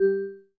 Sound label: Class Harp; Realme 8